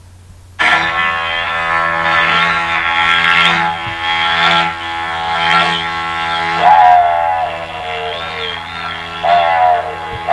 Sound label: Sound of the didgeridoo